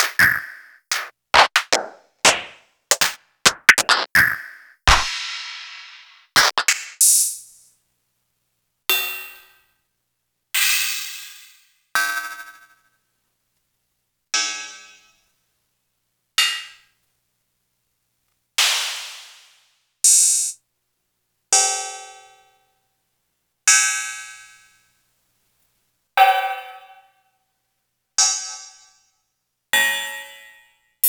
Elektron MachineDrum SPS1 MKII

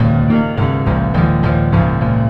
Gitlike Acousitc, Leads And Riffs; Sonic Foundry (Sony Creative Software); Synchro Funk

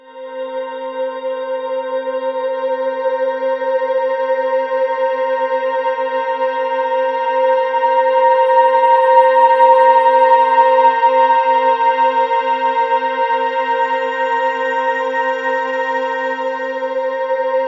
Ominous Drones; USB Soundscan vol.48; AKAI

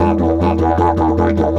E 150, DIDGE RIFFS

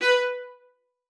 MART B4, VIOLA SPICC; Xsample VOL-5; 90 s Sample CDs

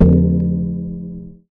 Baking Tray, contact mic, Metalics

